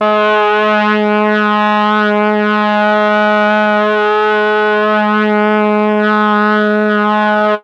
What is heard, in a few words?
MEL BRASS; ILIO; Propeller Island; Legendary M400